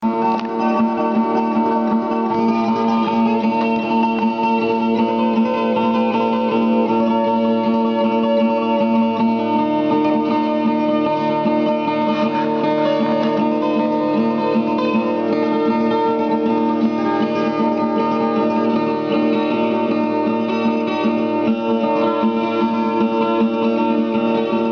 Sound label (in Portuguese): trinado na viola